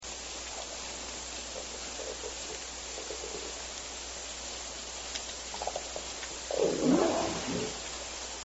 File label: main groan sequence, fish; biophony